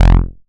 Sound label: Bass, 2600 F1, Synths, ARP 2600